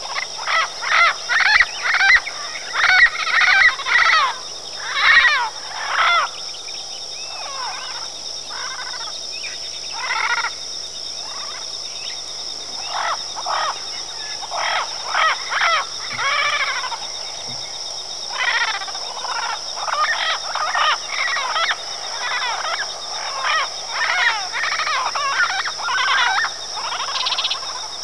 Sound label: sound, parrots